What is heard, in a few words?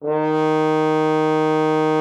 FR HORN D#3; Xsample VOL-3